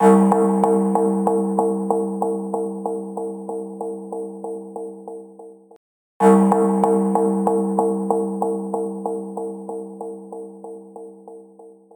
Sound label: gong in c, ringtones; dustinwells